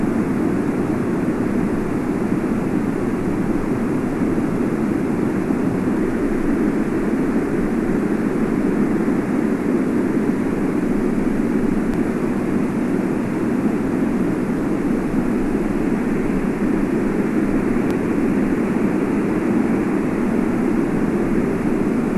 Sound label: WFR audio emfisis